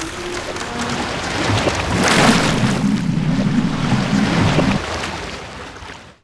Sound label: ship destruct; Sid Meiers Pirates; XBOX